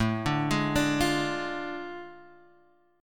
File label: A Major 7th Suspended 4th Sharp 5th, Guitar Drop D; chord